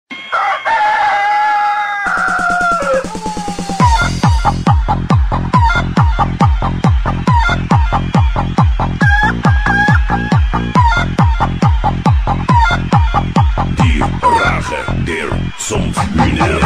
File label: Chicken Beat